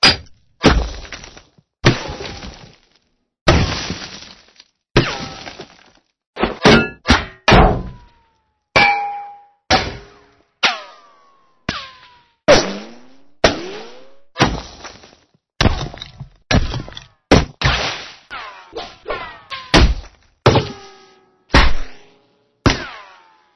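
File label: sound of ricochet from bullets and bomb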